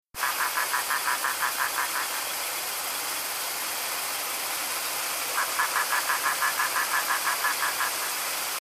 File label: hypsiboas heilprini, dominican republic; male; Neiba Range, south-central Dominican Republic, Hispaniola; quite different from those of other Caribbean hylids; Boana heilprini; First audio file courtesy of Mr. Jurgen Hoppe; West Indian